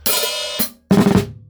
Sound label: Hi Hat Snare